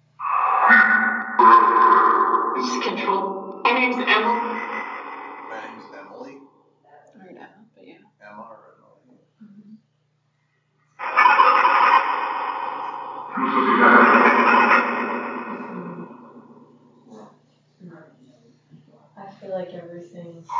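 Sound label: Train Station Phasma Box; Chessies Room; Mars Train Station – Return Investigation 2024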